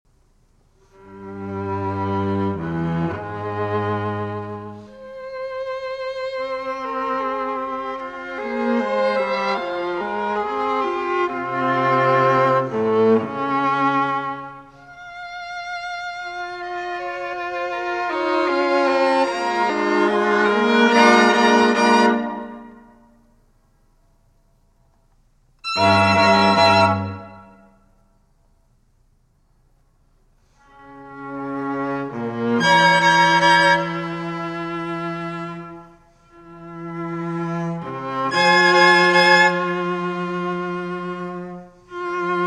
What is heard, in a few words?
String Quartet No. 16 in F Major IV. Grave; Op. 135; Der Schwer Gefasste Entschluss